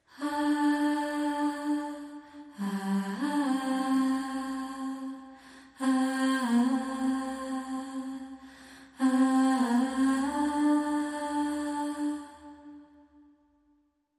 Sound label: Choir